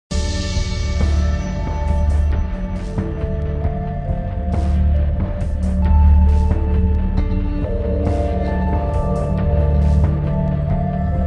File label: Ambience; mirrors edge ios gamerip 2010